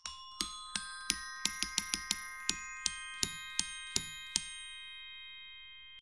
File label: Res Glock